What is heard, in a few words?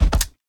reel bounce; netent; narcos